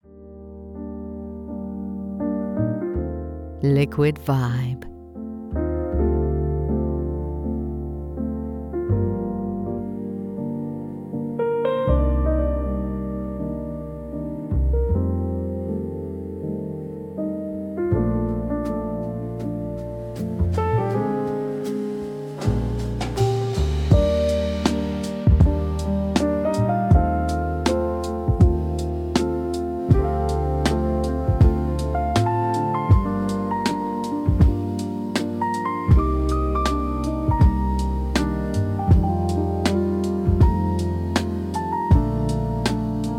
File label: Jazz; Still I Rise